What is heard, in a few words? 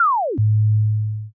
time stop